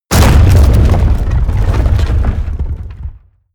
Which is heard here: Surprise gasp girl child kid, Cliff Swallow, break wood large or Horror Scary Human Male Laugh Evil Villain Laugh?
break wood large